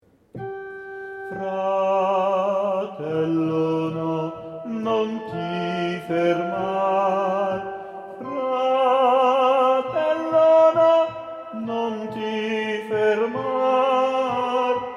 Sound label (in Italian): Tenor